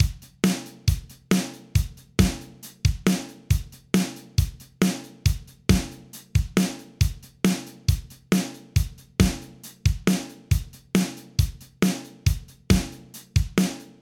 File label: dr pattern